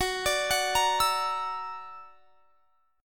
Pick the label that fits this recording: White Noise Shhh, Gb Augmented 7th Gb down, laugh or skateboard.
Gb Augmented 7th Gb down